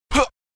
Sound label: Knight character shout